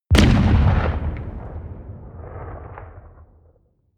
artillery medium distant

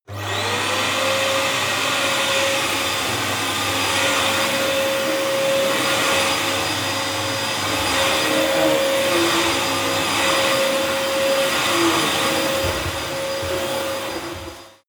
vacuum roomy moving

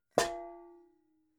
pots hit dull

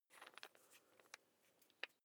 electric drill bit fasten

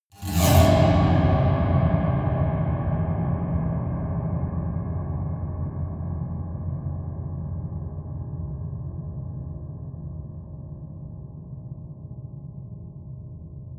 piano twang multiple fast low

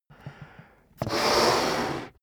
balloon blow breath in

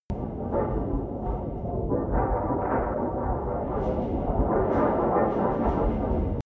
promo pad

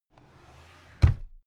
drawer cabinet carton close regular

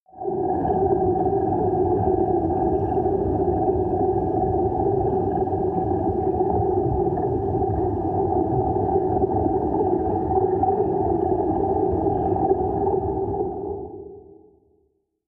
water fx dark

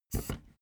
office chair hydraulics down stepwise

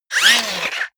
robot movement very fast

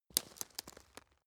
step leaves branch crack short

